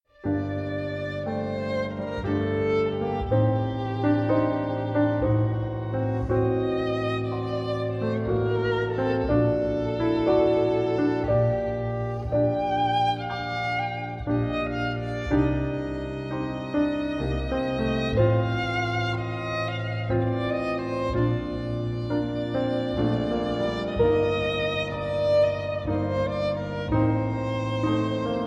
Violin and Piano; Adagio Variation; Arr. For Violin and Piano From Adagio in G Mi

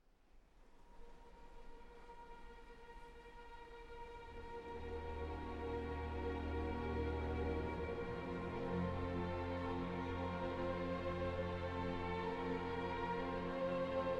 Adagio For Strings; Berliner Philharmoniker; Simon Rattle; Barber

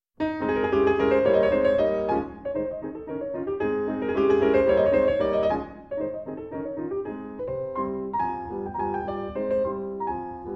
Mazurka in A flat; Chopin; Mazurka No. 8 in A flat Op. 7 No. 4

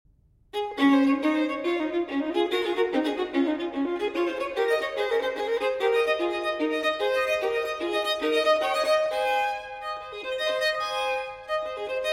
Solo Violin in D Major Allegro; Telemann Fantasia

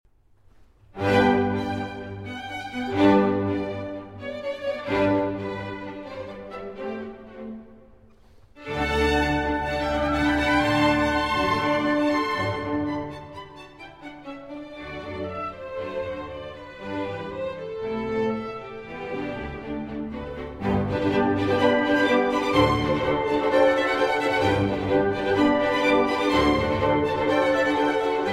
Violin Concerto No. 3 in G Major; K. 216; Mozart

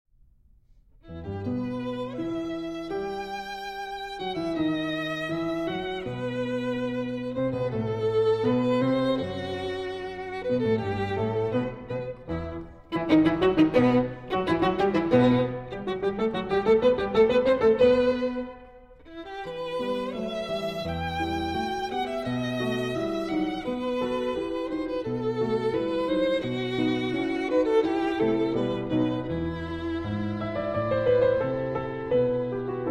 Sonata For Piano And Violin In E Minor Allegro

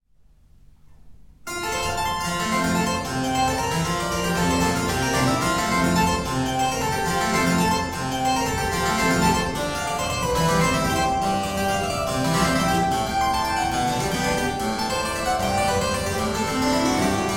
Keyboard Sonata in G Major, Allegro

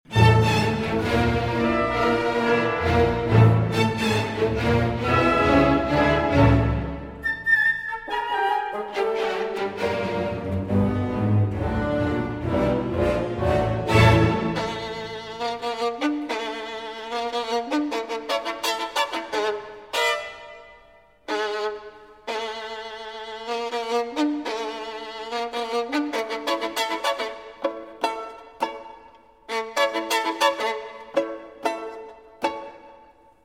Violin Concerto in D Major; Op. 35, TH 59; Tchaikovsky

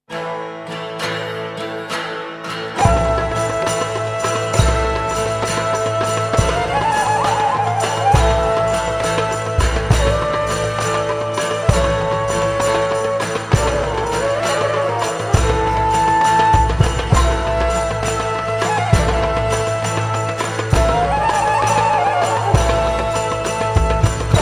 Medieval Middle Eastern Dance

